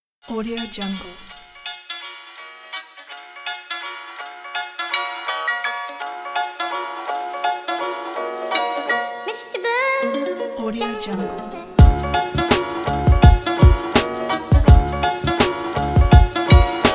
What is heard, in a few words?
cooking vlog